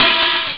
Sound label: hihat open; Amiga Soundtracker sample